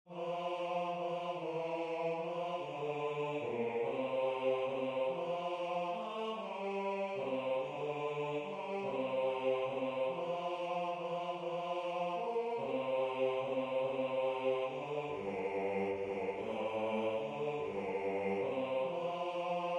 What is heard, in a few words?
Bass